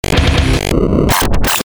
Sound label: Wrecked Acceler 8; Best Of N2O Library; Breakers & Transitioners